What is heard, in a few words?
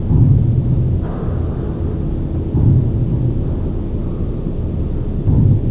Industrial Pounder Far; de alliance